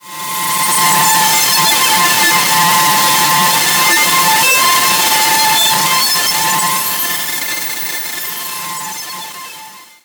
Static; Blastwave

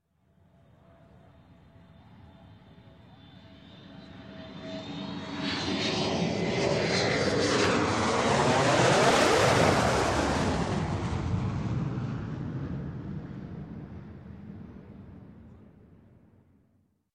Concorde Pass Overhead; Sound Ideas; Series 2000

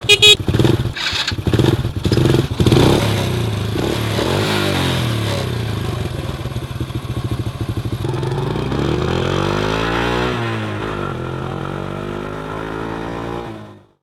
moped start drive, street traffic; best service Blue Box

